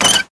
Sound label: valve start; buttons